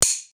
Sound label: wood misc, wood metal; wood metal SFX